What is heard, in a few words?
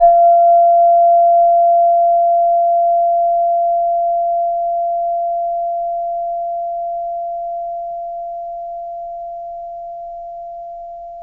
chime; Zodiac